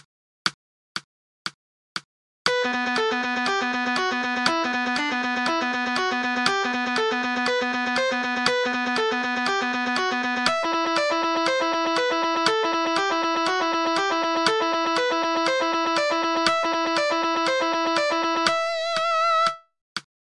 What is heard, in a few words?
alternate picking exercise, guitar